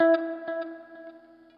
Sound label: NOODLE; Quantum Leap; Guitar & Bass